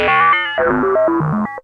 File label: harsh random, Electro FX; UNUSUAL KITS and FX; Atomhard Electro FX